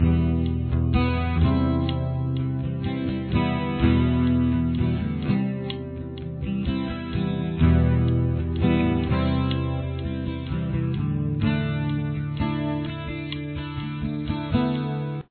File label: chords solo